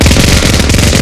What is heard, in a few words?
minigun loop; weapons; diprip